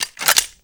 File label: Double Barrel Shotgun Clip Out; Warframe